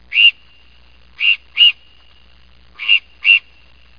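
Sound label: mallard drake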